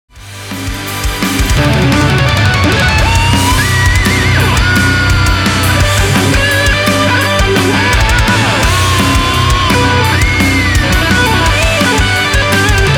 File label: Solo